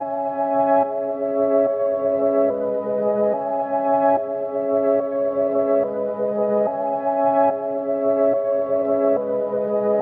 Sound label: 144 BPM C# Min Chords, Drill; Loop Stems; Cymatics; Warning; 2022 Melody Collection